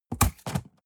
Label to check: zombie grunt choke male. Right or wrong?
Wrong